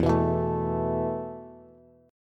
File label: E Suspended 4th E, down, Bass; Esus4 strummed